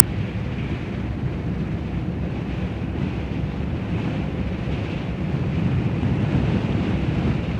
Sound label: wind gusty, ambient